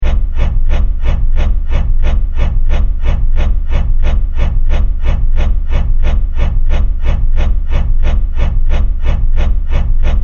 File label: Fan Blade Rotate, Fan, Blade, Rotate, Steady